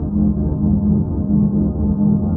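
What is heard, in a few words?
Ambience Sci-fi Energy Loop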